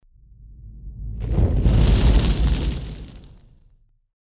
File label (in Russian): ognennyj perehod; Звук огня; Звук огня для монтажа перехода